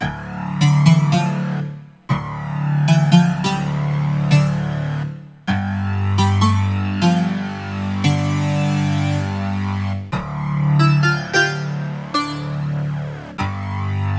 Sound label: Liquid Start